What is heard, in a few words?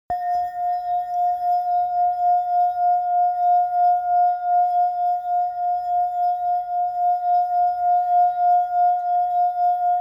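Brown Buddha F, singing bowl; Silver Tibetan Meditation Singing Bowl – 5″D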